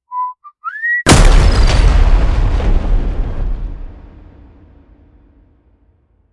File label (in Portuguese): assovio disparo